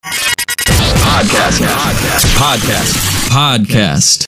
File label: podcast jingle